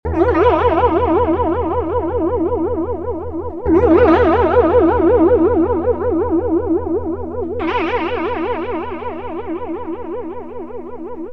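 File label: clean electric guitar boss bf1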